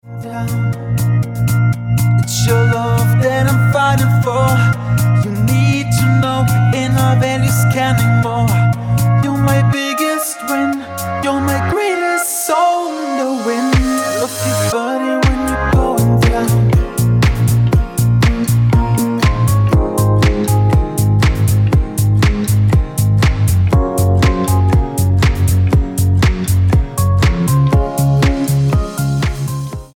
Original Mix Ringtone

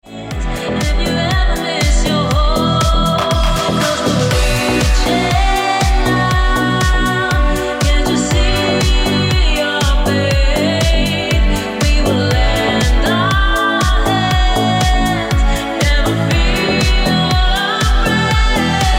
Ringtone

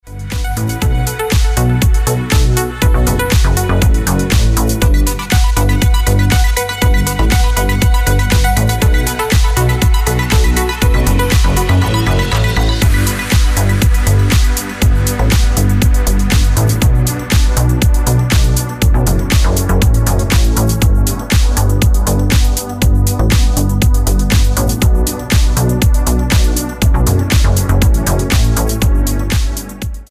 Original Mix Ringtone

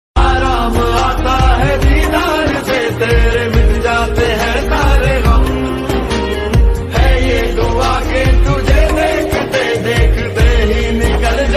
Hindi Ringtone

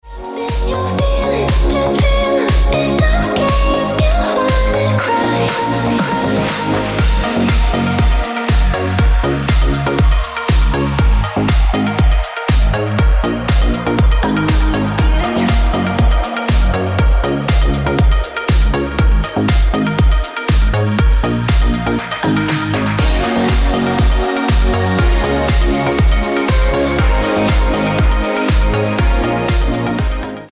Original Mix Ringtone

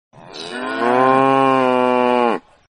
Buffaloo Bellows

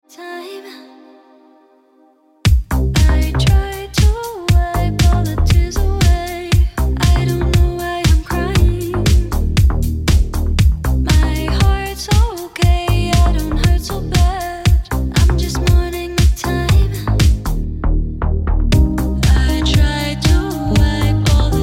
Ringtone

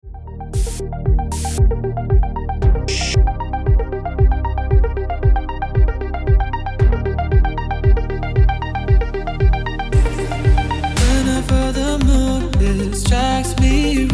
Ringtone

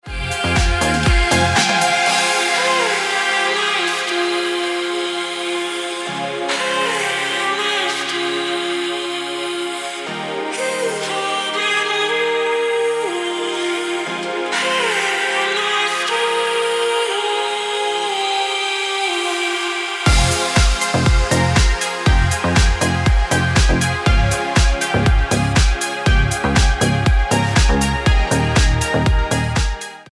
Ringtone

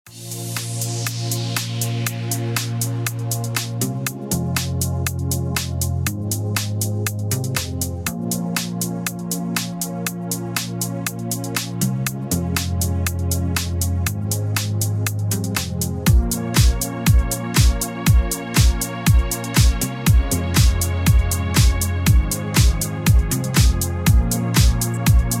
Remix Ringtone

